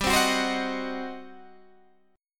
G Major 7th Suspended 4th Sharp 5th, G, down, Guitar, Open G